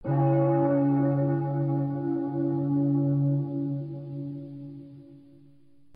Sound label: church bell